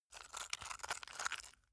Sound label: stone scrape; natur-tiere; steine